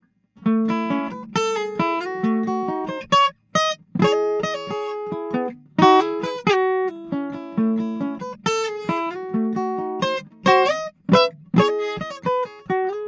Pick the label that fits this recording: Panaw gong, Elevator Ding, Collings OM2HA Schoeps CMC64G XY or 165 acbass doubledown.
Collings OM2HA Schoeps CMC64G XY